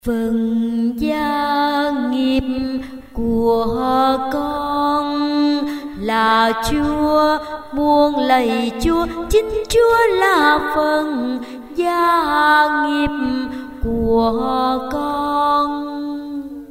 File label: alto